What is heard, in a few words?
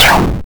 Kill Enemy 8 Bit